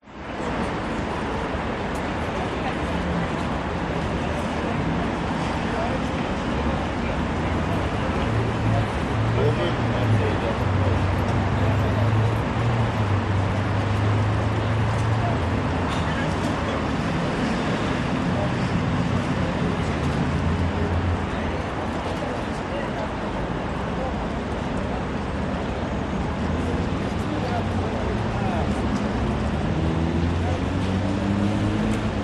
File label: moscow russia crowd summer kazanskiy train terminal main entrance heavy crowd; with luggage